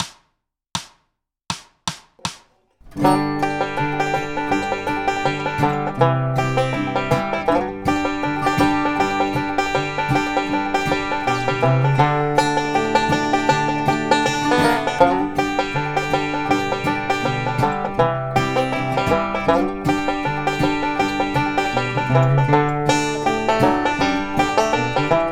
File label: banjo backup; Jack Tuttle’s Bluegrass Banjo Primer